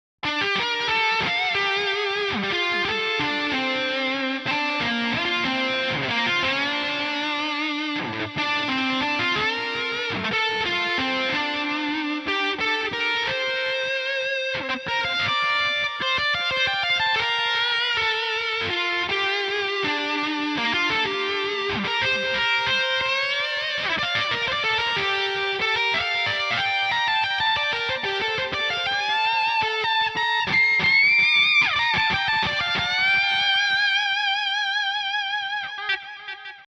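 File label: lead2 bar98 bpm123, guitars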